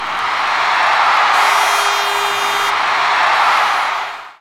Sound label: CROWD; E-Lab; X Static Goldmine 1